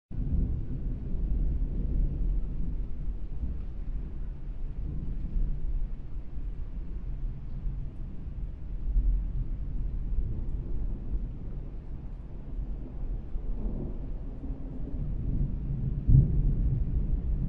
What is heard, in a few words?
Rain Rolling Thunder; Rain World; ps4